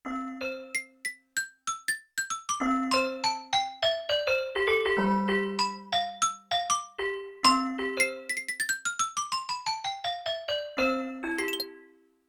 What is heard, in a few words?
Handbell Short, Yamaha TX16W; Wind Chime & Handbell